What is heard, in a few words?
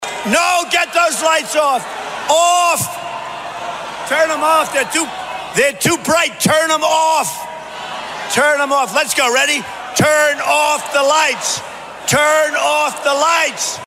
light strum